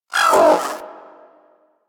Vocal Pain; Warframe; Pets; Zanuka

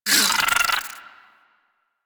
Infested Burst Rifle Reload; Warframe

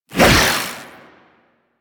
Briefcase Katana Whoosh A; Crp Briefcase Katana

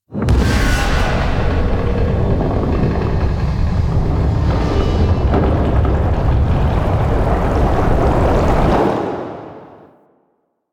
Queens Chamber Warframe Build Up; The War Within

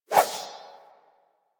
Rapier Whoosh; Swords

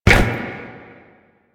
Mount Balloon Land; Warframe; Quests; The New War

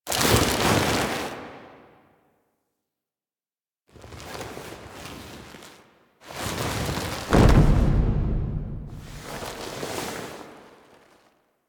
Foley C; Warframe; Quests; The New War; Lotus Gem Part One

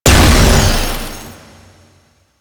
Portal Shatter Deactivate; Warframe; Duviri

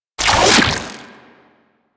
Rifle Charged Grapple; Warframe; Infested; Quanta

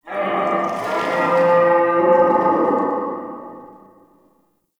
Amb Corrupted Animal Distant; Ambience; Mendicant Area

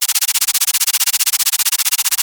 UI End Of Match XP Count; DE; Warframe

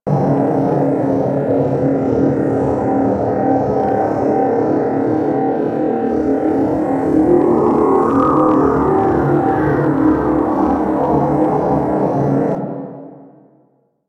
Tractor Beam Bridge Amb A; Crp Space Bridge Transition

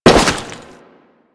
Crewman Step Goo, Footsteps; Warframe; Corpus

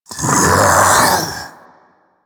Infested Splitter Split Vocal Alerted; Warframe; Grey Strain

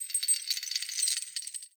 Under City Chain Creak

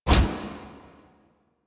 Scan Impact Pre; Warframe; Warframes; Frumentarius

